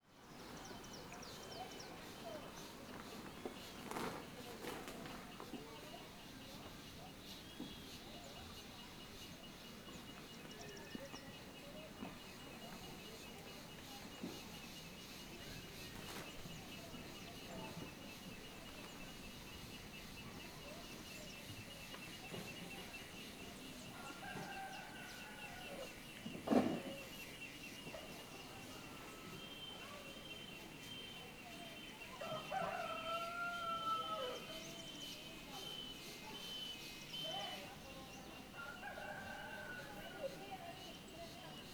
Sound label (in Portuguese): Acampamento MST com cigarras vozerio galo